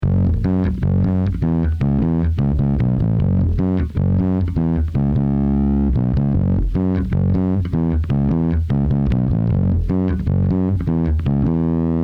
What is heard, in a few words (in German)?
Bass VC-76 ALL Button Input voll aufgedreht Attack und Release 7 bis 20 dB Gainreductio; 20 dB Gainreduction